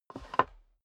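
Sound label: step dress boots wood creak scuff, footsteps; schritte; menschen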